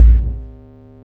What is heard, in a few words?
drm 138, Techno, drum-loops; Techno ID; modular-fiction